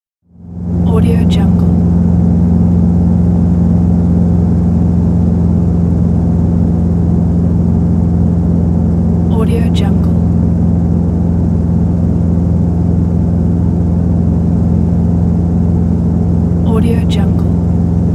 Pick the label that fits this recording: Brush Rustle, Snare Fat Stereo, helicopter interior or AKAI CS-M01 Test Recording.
helicopter interior